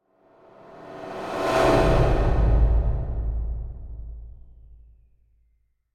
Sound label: Metallic Whoosh - Appear Vanish; Hollywood Edge; sound designer's toolkit v2